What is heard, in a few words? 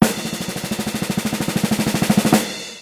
Drumroll